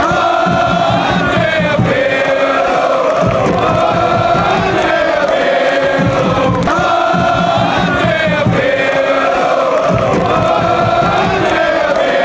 corner song, chants; pirlos

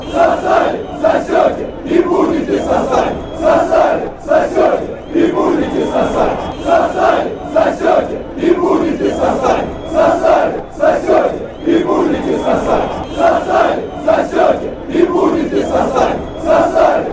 cska chant

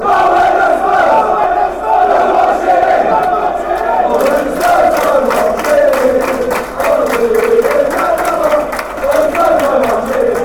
marching in fast version, fan chants

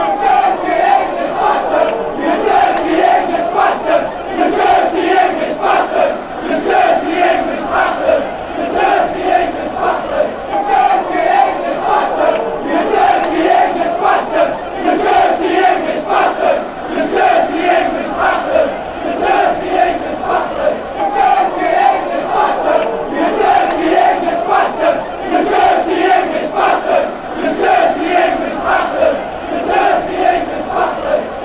dirty english, chants; swansea; Oli Cooper Is One of Our Own Chant

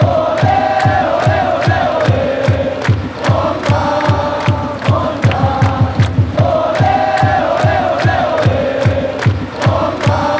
ole, chants; honda

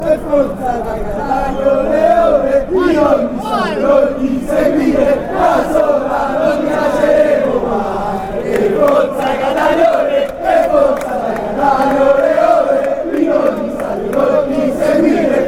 ole, fanchants

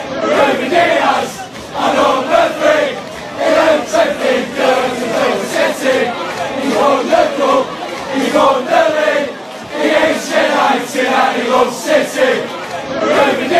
player chant; ruben dias; manchester city

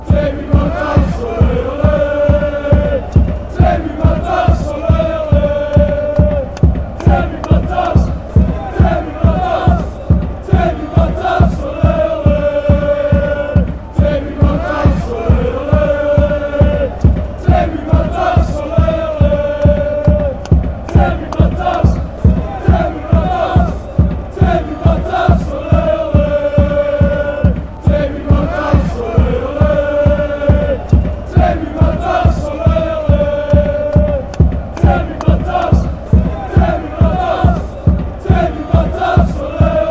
chant, fanchants; groningen

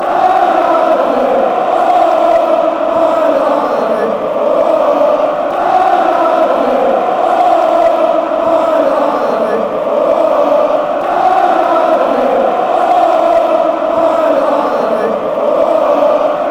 ohh, fanchants

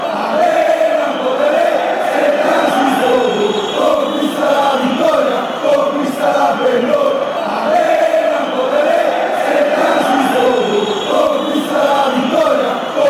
ale, fan chants

